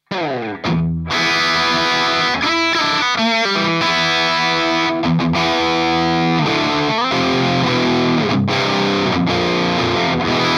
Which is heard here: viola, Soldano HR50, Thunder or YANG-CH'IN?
Soldano HR50